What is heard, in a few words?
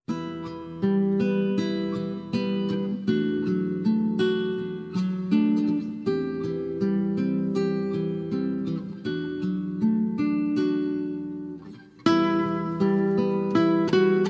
Gut Guitar Version